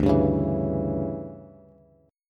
D Major 7th Suspended 2nd Suspended 4th D down Bass; DM7sus2sus4; strummed; JGuitar